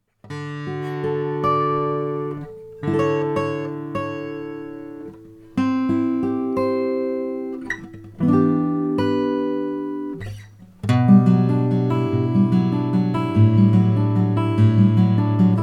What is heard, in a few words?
lowden honduran rosewood, guitar